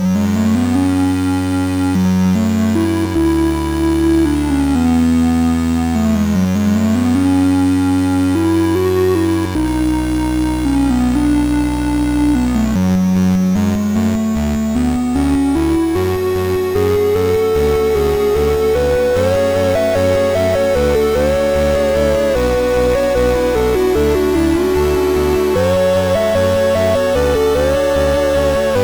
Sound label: village loop